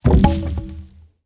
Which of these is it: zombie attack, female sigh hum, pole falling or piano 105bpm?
pole falling